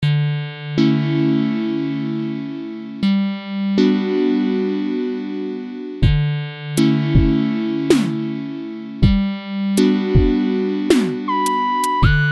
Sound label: epiano